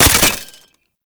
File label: Grenade Launcher Low Clip; Weapons; Scaldra